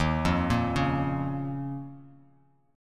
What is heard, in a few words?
D sharp 7th Suspended 2nd, Bass Standard; arpeggiated; JGuitar